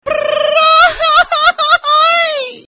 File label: mariachi notification